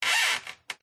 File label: Wood Stress Creak Squeaks; DPA4060; pmsfx